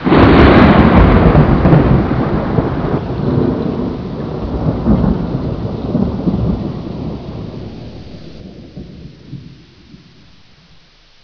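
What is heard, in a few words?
thunder; enpro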